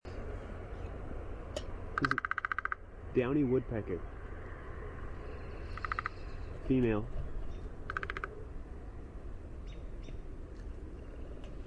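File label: Downy Woodpecker drumming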